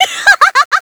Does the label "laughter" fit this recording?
Yes